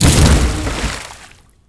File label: Hit jetski